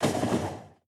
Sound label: train tie; factorio; Factorio 0.14.21